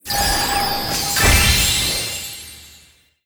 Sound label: Starbox Open Super